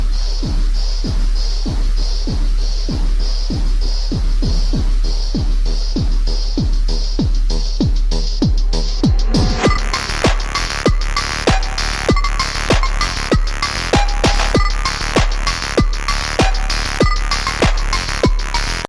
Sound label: void super slowed phonk void bass bassboosted